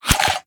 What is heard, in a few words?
Swamp Jumpers Close Attack; we are the dwarves gamerip 2016